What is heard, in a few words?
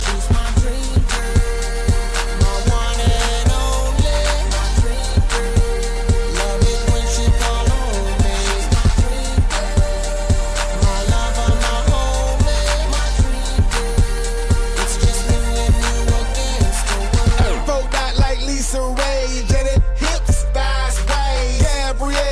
Remix